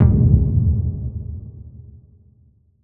Enter, Hits

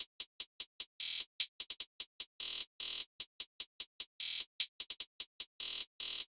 Hihat Loop 150 BPM; Death Row; Cymatics; Fiji Hihat Loops & Percussion

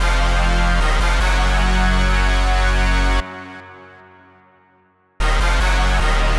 Brass Loop, 150 BPM F Min; Instruments; Brass Loops; Cymatics; EDM Starter Pack

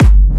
Techno Kick; 30 Techno Kicks 2025; Ghosthack

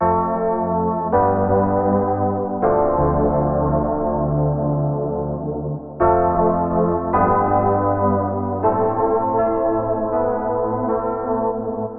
Chorus Keys Fm 80Bpm, Lo-Fi; Granular Musical Loops; Ghosthack Advent Calendar; Lo-Fi Kit

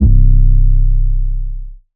808 C; Advent Calendar - Day 9 - 808s

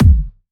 Kick, Bonus Drums

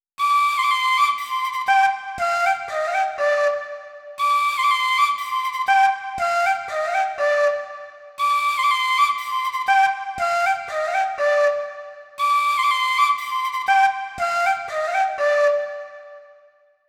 120 BPM C Min Flute; Cymatics; Combat Zone; 2022 Melody Collection Platinum Expansion

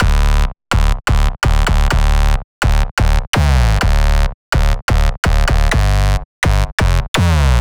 Bass Loop Dm 126; Loops

